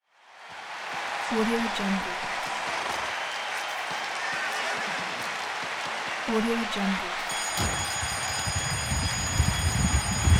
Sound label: horse race; kuksaudio